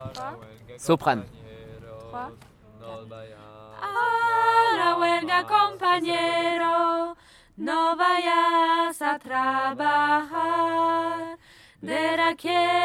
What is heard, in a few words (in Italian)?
soprani; A la huelga